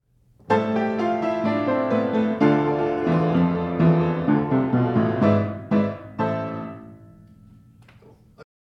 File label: Silent Movie Hurry Music; Sam Fox